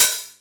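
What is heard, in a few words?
Hat Open, Novation Drumstation; DrumstationV1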